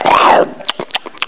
EAT GHOST

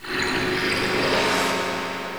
hum, ambience

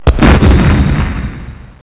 EXPL; ARRAKIS

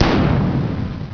CANNON

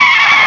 LAND; VIETNAM

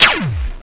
OLD LASER

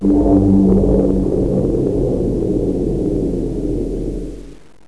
AMBI

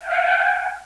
SKID

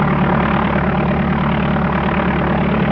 JET

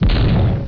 kick

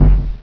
throw

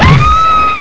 SPLAT

